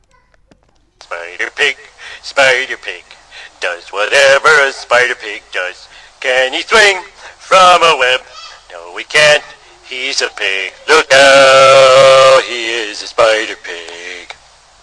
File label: ringtone